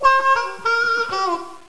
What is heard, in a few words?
Mouth Organ; psion cd vol1